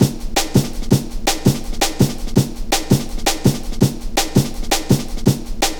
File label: Pride, Drum Loops, 165.5, Jungle; Zero-G Jungle Warfare 1, 2 & 3